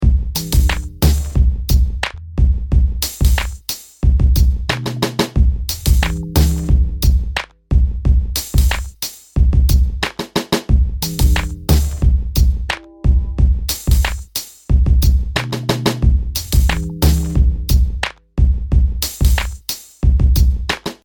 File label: beat snippet